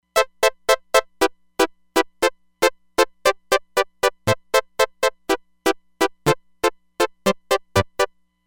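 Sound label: Sequence Saw, synth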